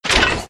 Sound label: Equip; Burnin' Rubber 5 HD